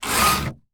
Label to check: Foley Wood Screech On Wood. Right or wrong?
Right